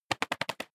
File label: bat wingflap